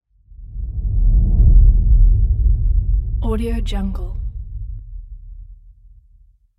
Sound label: low whoosh